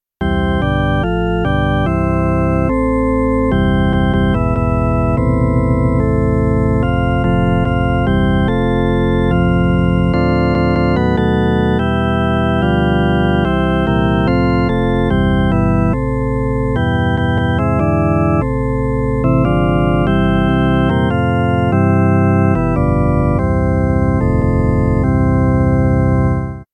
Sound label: organ & Flute; This Little Light of Mine